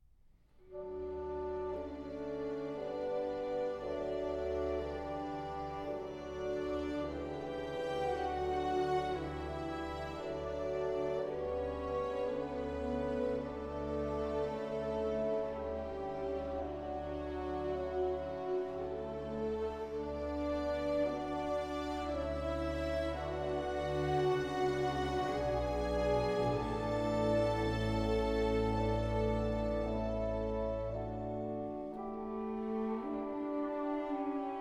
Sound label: Piano Concerto No. 1 in D minor, Adagio; Op. 15; Johannes Brahms